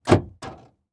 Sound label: Truck Ford Ranger Unlock Door Interior; transportation